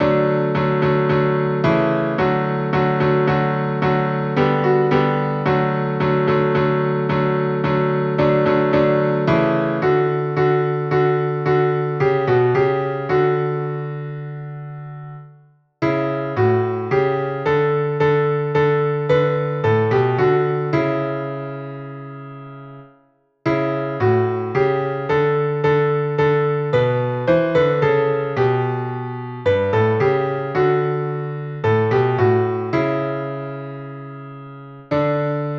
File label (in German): Klavier